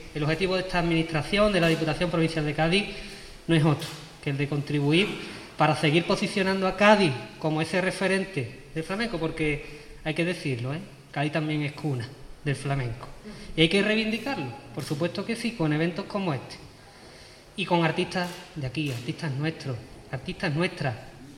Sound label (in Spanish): Flamenco